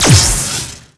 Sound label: Flak Cannon; Sid Meier's Civilization 4 Complete; Beyond the Sword; Final Frontier; Units